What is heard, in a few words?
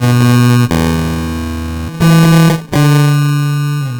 Guitar Loop, 120BPM